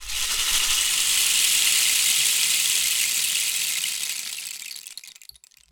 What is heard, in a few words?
Rain Stick, Percussion Samples